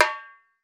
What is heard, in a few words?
REPIQUE; Latin Drums; Latin Drums & Rain